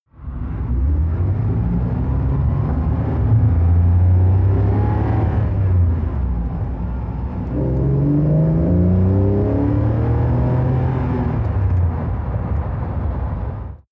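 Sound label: sports car interior engine drive accelerate fast short; verkehr-transport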